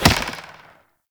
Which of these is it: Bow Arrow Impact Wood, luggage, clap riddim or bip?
Bow Arrow Impact Wood